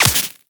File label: Player Laser Impact Flesh; Warframe